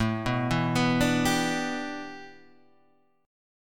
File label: A 7th Suspended 2nd Suspended 4th; A7sus2sus4 arpeggiated